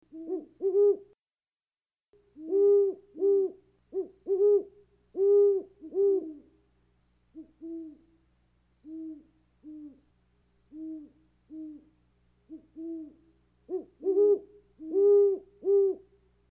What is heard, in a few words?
great horned owl; owls